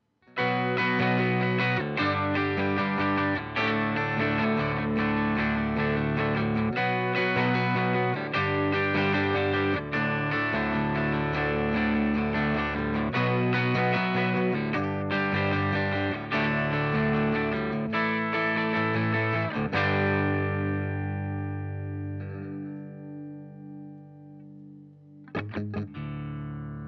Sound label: clean ibanez gio gsa60